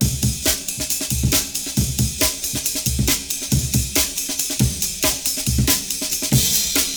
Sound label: breaks